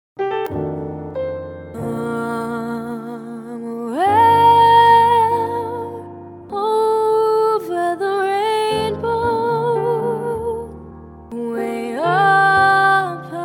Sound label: Jazz